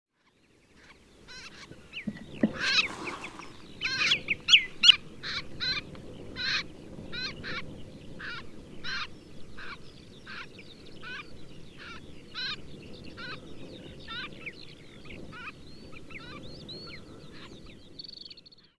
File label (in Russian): aziatskiy bekasovidnyy veretennik golos